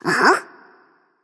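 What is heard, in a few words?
nonverbal agree; smite; ratatoskr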